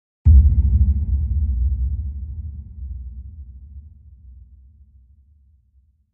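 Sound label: Electric Chime LFE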